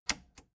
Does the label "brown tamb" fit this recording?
No